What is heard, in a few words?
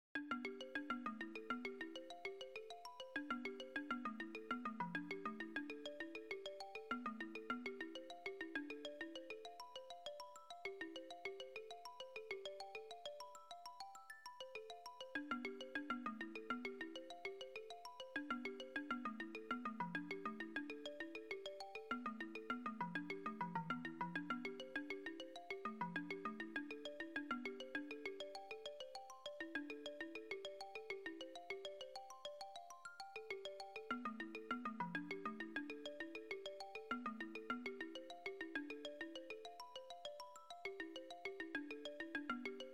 african xylophone